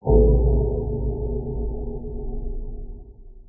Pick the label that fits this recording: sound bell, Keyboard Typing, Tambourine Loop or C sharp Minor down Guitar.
sound bell